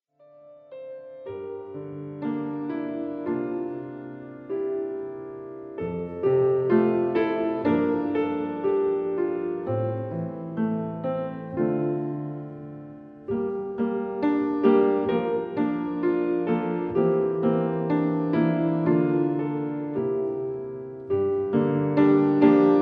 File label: Piano; Larice Music; Did You Think to Pray